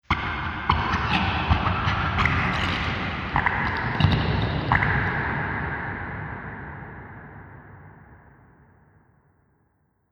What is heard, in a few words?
Slime Pits Fantasy Horror Ambience; Slimy Sound Effects; Andrew Fleming